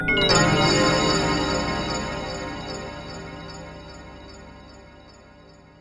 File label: CLOC A4, BELLS; Back In Time Records; Big Bang